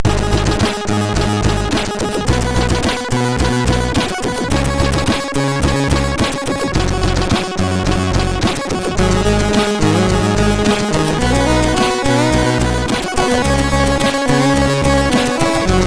Simple Music, MOS6581R3; hvsc; SoedeSoft

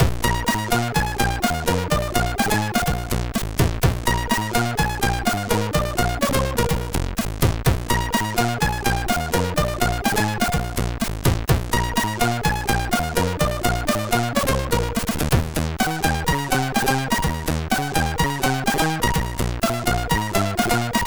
sid MOS6581R3

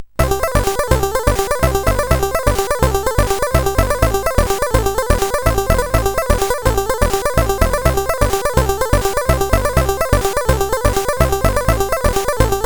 Beat, CSG8580R5

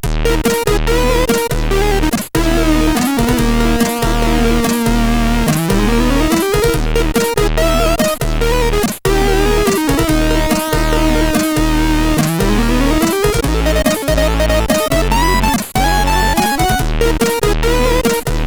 Arabicee, sid CSG8580R5

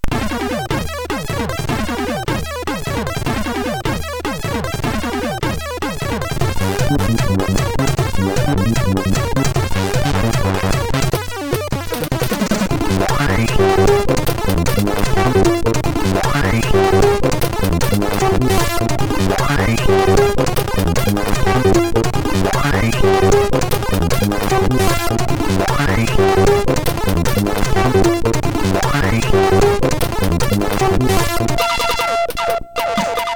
Trance sid MOS6581R4; hvsc

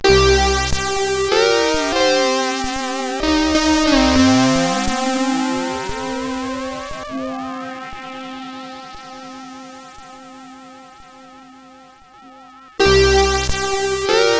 Jingle, CSG8580R5

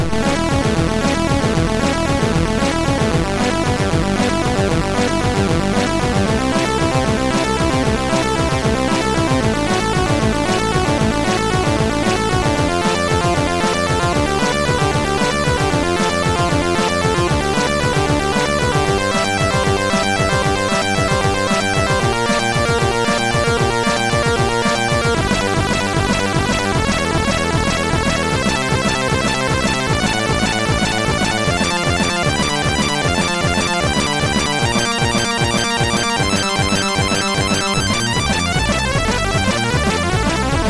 sid, MOS6581R2

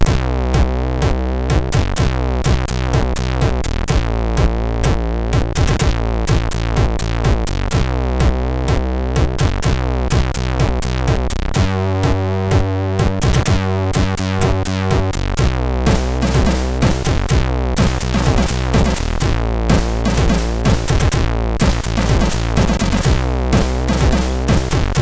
Two Track Drum, MOS6581R3; sid

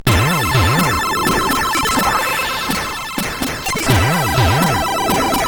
X-Music Remix, CSG8580

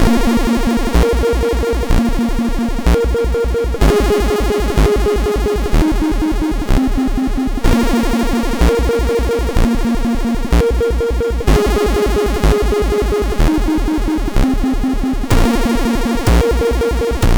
Eon sid MOS6581R2

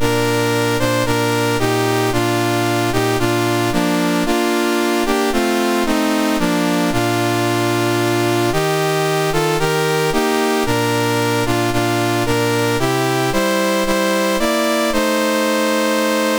Fiji, National Anthems, MOS6581R3; Dave Marquis

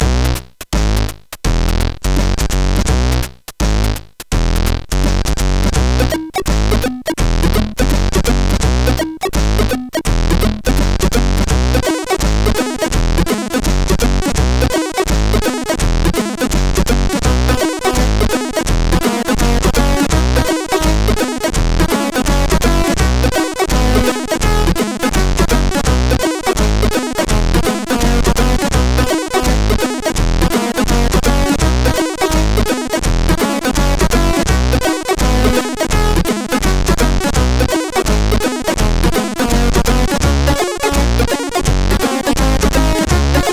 Pianowork, CSG8580R5

